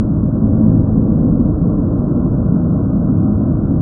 Loop Ambient room

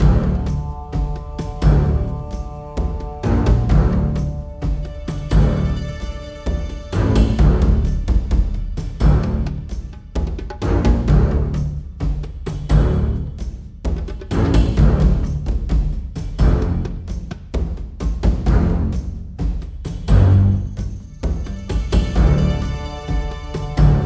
Combat Music Loop; Iron Tides macOS Windows gamerip 2017

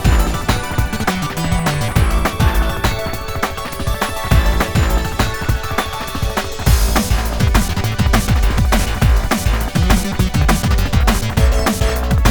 Stage Loop

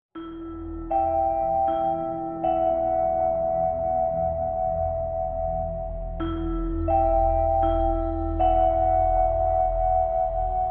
suspense; The Mystery Team; PSP

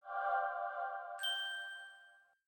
select item zoom ding; witch hunters stolen beauty windows gamerip 2012

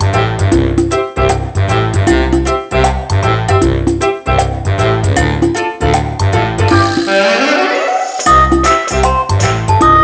Ingame Music

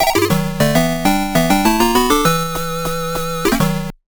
Act Clear 8 Bit

